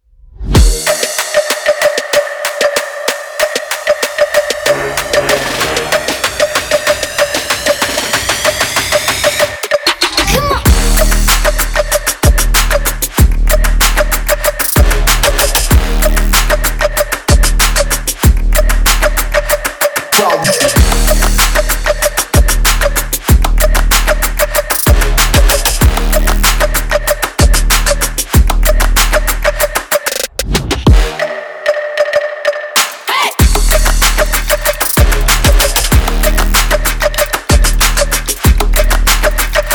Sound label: trap; katworkshop